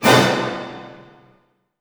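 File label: F# diminished fortissimo, orchestra hits; Sound On Sound - Peter Siedleczek's Orchestra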